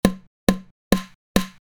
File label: Snare